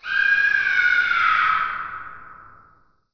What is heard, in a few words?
scream